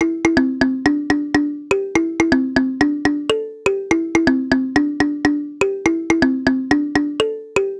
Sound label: Synth, 123bpm, C, Kalimba